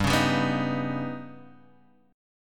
Eb Augmented 7th G

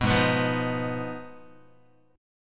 Ab Minor 6th, Ab, Guitar; Abm6 strummed; JGuitar

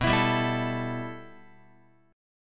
B 7th Suspended 4th Sharp 5th B down Guitar; B7sus4#5; strummed; JGuitar

